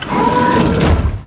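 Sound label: close door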